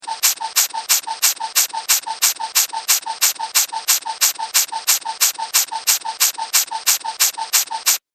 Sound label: Cartoon Repetitive Pump